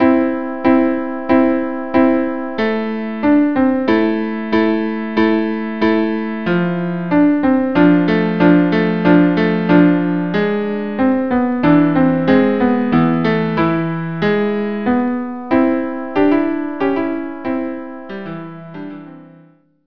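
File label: cello cello; Hebrew Melody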